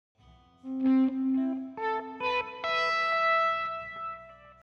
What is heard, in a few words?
Melody funk